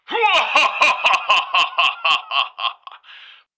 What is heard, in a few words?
Santa Laugh; WarRock